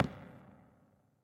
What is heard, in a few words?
footsteps barrel; Fate The Cursed King